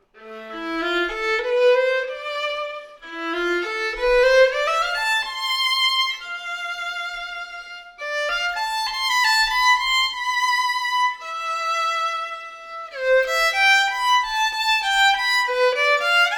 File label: Tango volin solo